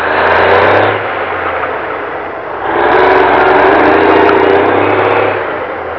pull away, ambience; blackops

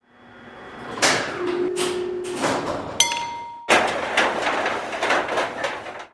elev stop; vendetta; plats

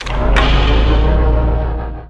train start; vendetta; plats

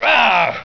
grunt die; ambience; poke646

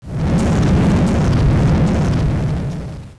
door move; vendetta; doors

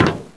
metal; blackops

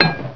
pipe bomb bounce; weapons; vendetta

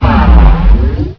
panther alert; custom monsters

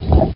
move rock

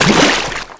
water splash; ambience; poke646

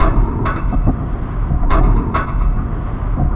ambience; vendetta; lowman

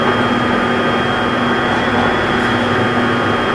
freight move; vendetta; plats

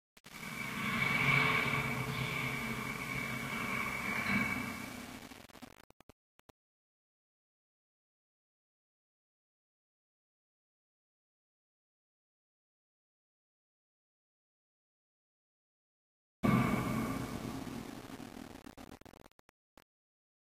fan hit

